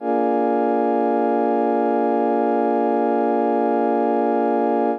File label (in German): akkord b7 d1 tief